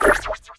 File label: portal exit, portal gun; Source Engine; Portal 2